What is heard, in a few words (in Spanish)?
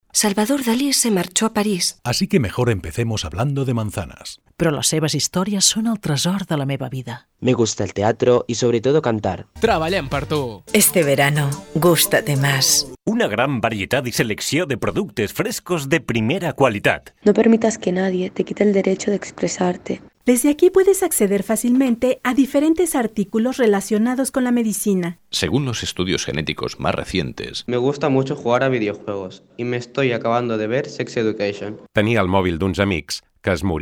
Muchas voces